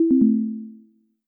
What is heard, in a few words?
Sweeper Scored